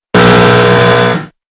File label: buzzer; got talent; red x